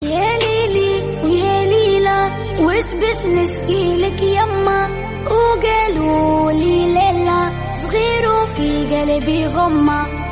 Arabic Ringtones

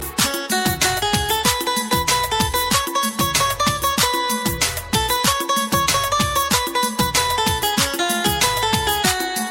indian best guitar

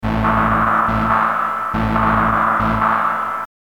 SMS Ringtones

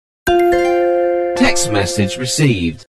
Note 8 sms; samsung

